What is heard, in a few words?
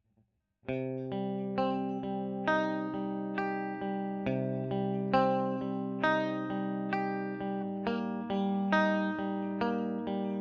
E.GTR Royer